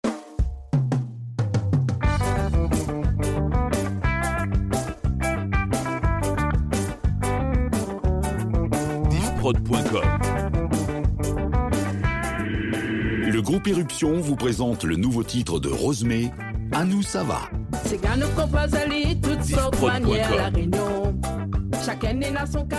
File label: Power intro; First Reunion